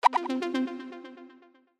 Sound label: Daily Surprise Spin